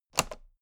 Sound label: toolbox latch close heavy